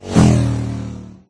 hoverbike whoosh; There; ThereClient

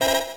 charged; MMBNMMOP

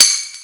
Tambourine Bang; Drums

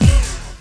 open; Quicken

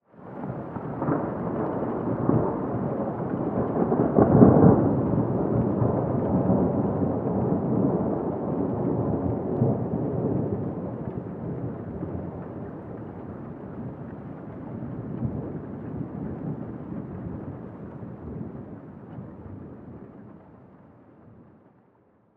thunder

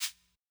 Shaker; Drums; Ableton Live 12 Lite Core Library

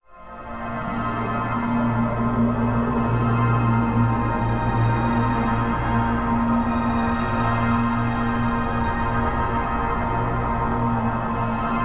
Atmos, Synth; Loops; Tonal; Ableton Live 12 Lite; Core Library

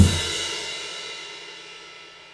Kick Crash Combo, Drums

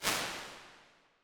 med verb, Large Rooms, IR Samples

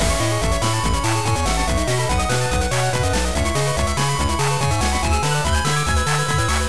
win fanfare loop; MMBNMMOP

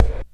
Kick Popped Noise

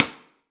Rim Sidestick Punch

